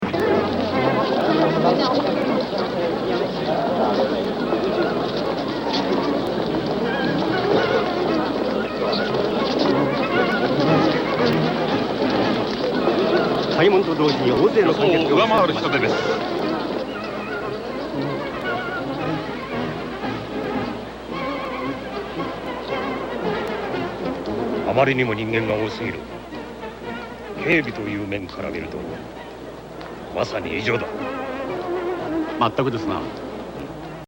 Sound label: Victory